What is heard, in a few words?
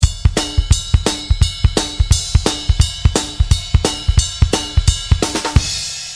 alt rock loop, DrumLoops